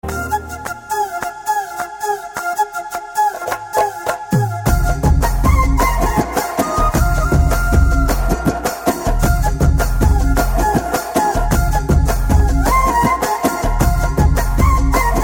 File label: bg flute tone